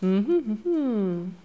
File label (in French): hum reflexion